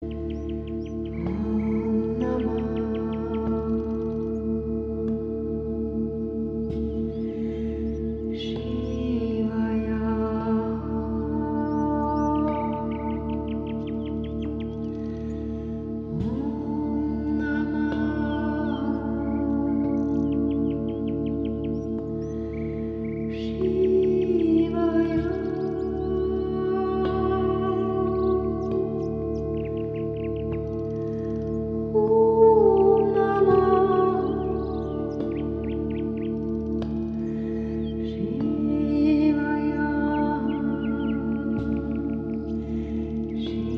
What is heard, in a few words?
om namaha shivaya